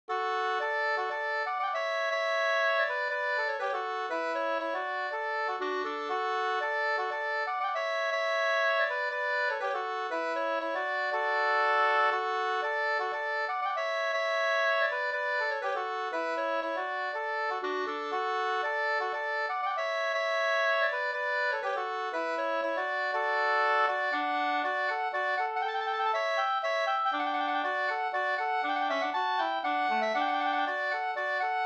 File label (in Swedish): hamburska Brudpolska, 2st; fr Flen Sd